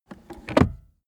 car accessories armrest close

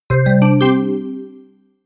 notification call

